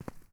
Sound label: Footstep Dirt Light; Footsteps